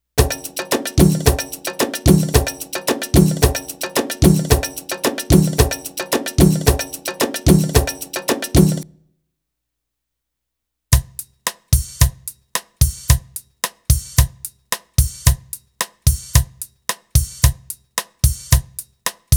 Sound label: Arabic Rhythms